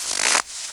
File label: SNOW, FOOTSTEPS; 1000fx